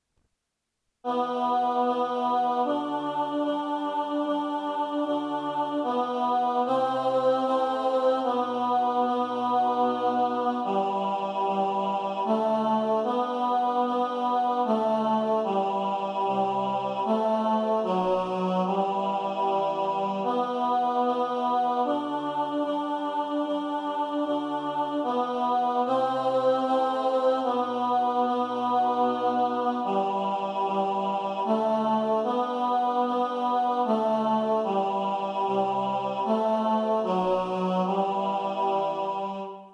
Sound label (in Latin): Oculi nostri tenor